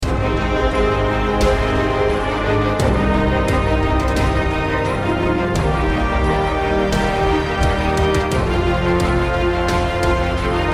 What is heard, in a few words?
ENERGETIC MENU + SHAKERS (Loop)